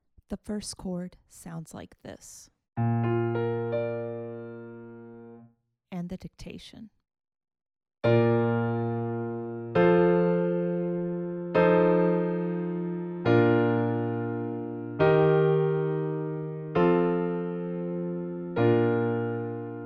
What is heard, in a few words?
harmonic dictation